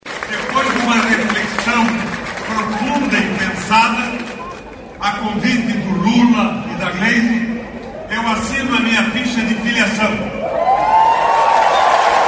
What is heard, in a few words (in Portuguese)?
GRITO DE GUERRA